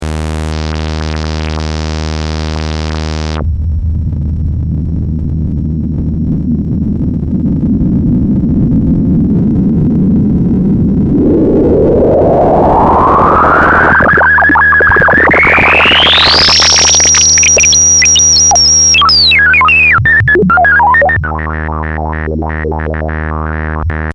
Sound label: samplehold; hoel LFO